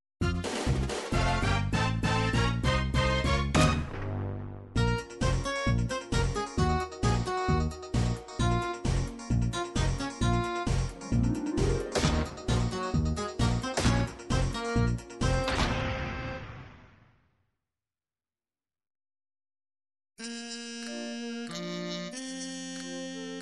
anime chacha

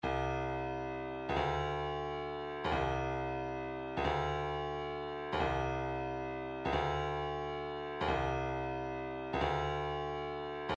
165 shaker, midi

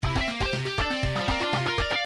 gameboy camera dj sample, midi

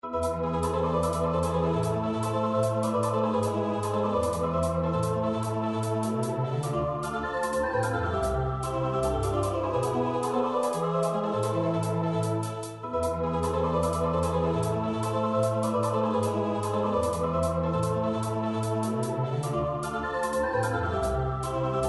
futuristic remix; Gameboy Tetris Music A

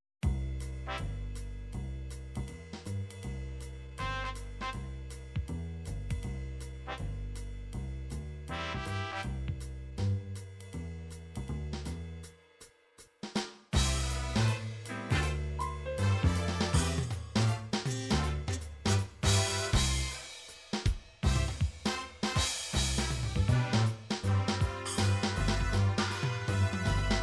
jazz big band

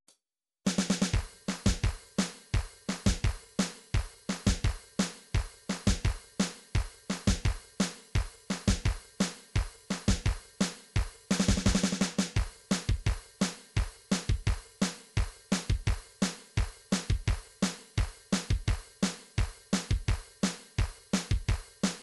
midi drums

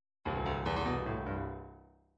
midi fills rock

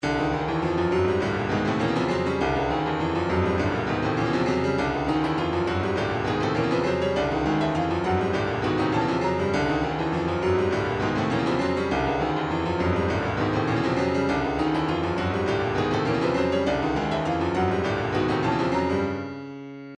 retro funk, midi; abuzed

